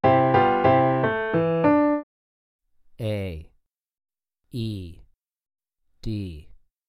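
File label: 3 Note Melody Piano Major a2 e2 d3; ear training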